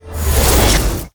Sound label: atk black ring